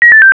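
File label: disconnect tone, Samsung SGH-i710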